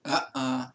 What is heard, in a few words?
uhn-uh; prosodic patterns english conversation